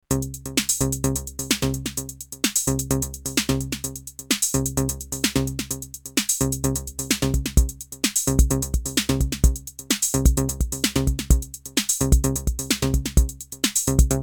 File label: Micromoog TR-606